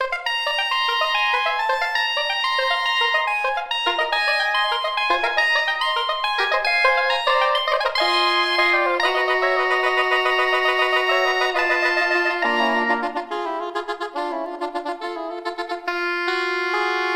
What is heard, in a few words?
Funfare, played on Soprano Saxophones; four saxophones